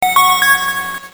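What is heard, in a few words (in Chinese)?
琴声; PPT音效; 清脆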